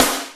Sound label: DR550 Snare, Boss DR-550 Drum Machines; 300 Drum Machines